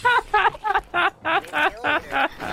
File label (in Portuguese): risadola